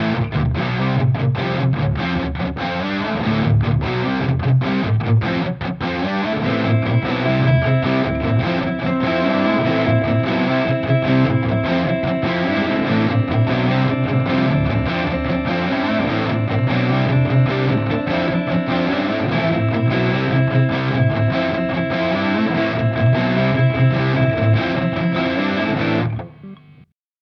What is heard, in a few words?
Gain Boost Fat Singlecoil; Crazy Tube Circuits Space Charged V2